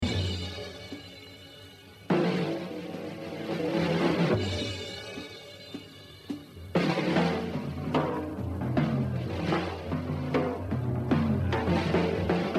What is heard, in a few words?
Etude, Hard Bo, jazz drumming; Art Blakey; The Evolution of Jazz Drumming (Gottlieb)